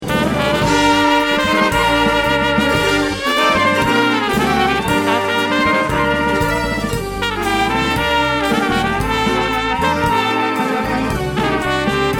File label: alienation tango